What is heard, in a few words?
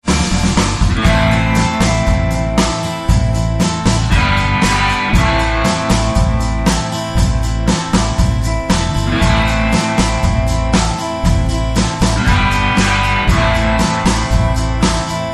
Alien Surfer